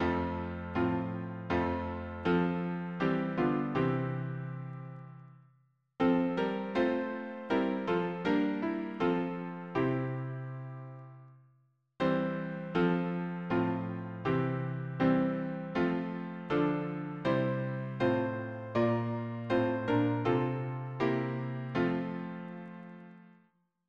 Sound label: harmony piano